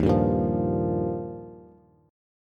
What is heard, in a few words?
Eb Major Add 9th, Bass; Ebadd9 strummed; 11,10,8,10; JGuitar